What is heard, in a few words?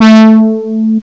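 brass synth, ANALOG; CMI Sound Library Rev 1.3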